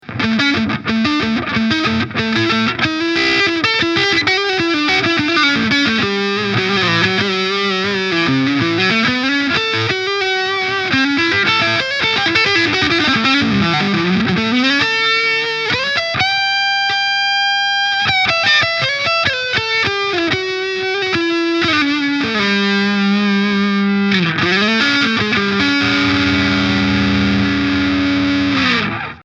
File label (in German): Hals verzerrt HB; Ibanez RG421HPAH